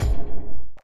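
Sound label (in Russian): удар тяжелым предметом в помещении с эхом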